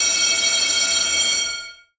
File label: alert